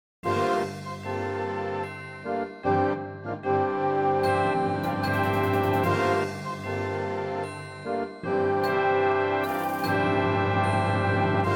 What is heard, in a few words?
Tkiah Fanfare